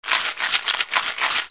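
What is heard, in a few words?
percussion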